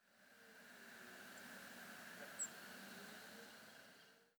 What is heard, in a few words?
Erithacus rubecula; SETE